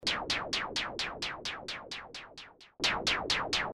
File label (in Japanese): ノイズ 刻み ボリューム